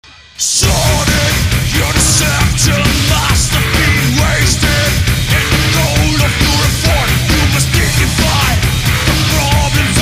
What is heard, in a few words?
distguitars; Distorted Gutiars; orchestral / movie style; fireburst